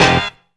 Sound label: BODYBLOW HIT; Zero-G; Funky Elements